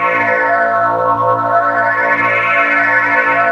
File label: A#3 OOW PU; Northstar; The Wizard VOL-1; SYNCLATRON